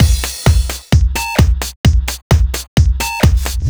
130 Daft Bully No Clap, Drum Loops 130bpm; Zero-G; Soundclash Nu Dance Electro; Acidized Wavs

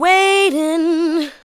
ADLIBS

